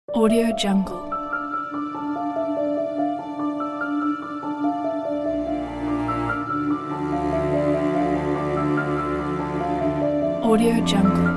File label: epic cinematic emotional